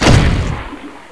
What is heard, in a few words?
launcher impact, weapons